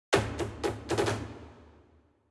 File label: Music Battle